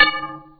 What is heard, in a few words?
clang, CLANG